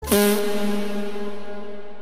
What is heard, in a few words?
fart echo reverb